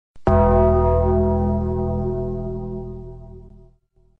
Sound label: UNDERTAKERS BELL MEME SOUND EFFECT